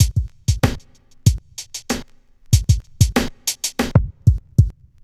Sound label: drums; Sonic Foundry (Sony Creative Software) - Stylus Pressure Urban Grooves on Digital Wax; Chillin Out